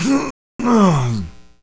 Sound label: death; ragemap2025; fournines